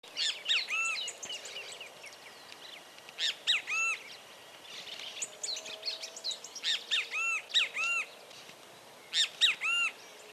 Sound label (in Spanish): Benteveo Canto; aves